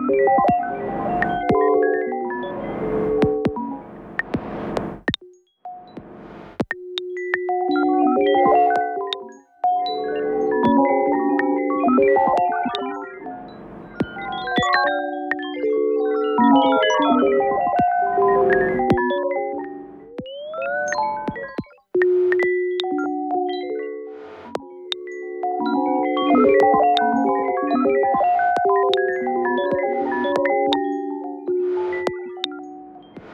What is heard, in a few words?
texture alien chime